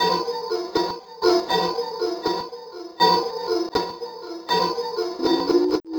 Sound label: Rhythmizer; Sonic Foundry (Sony Creative Software); Synchro Funk